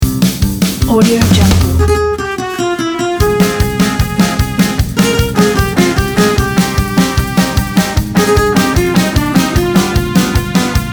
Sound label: Spanish pasodoble